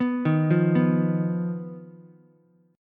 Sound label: B Major Flat 5th B Ukulele; BMb5; arpeggiated; 4,3,1,2